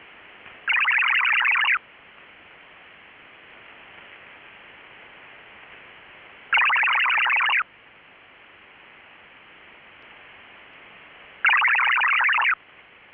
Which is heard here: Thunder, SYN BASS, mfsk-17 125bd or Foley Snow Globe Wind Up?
mfsk-17 125bd